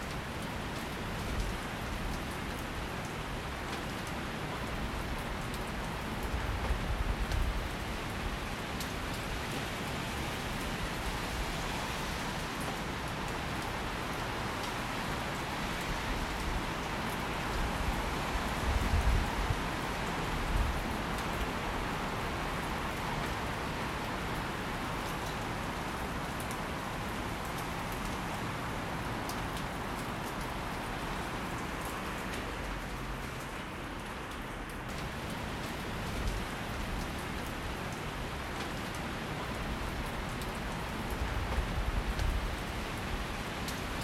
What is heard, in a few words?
rain